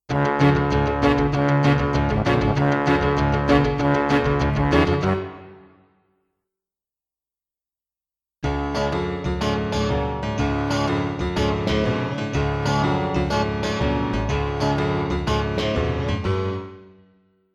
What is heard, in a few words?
Aural Test, drum kit